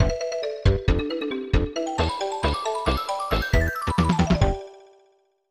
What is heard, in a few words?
Victory; Shanghai Banri no Tyojyo; The Great Wall; SNES